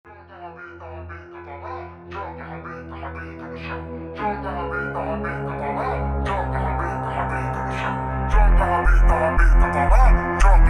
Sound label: FUNK - Slowed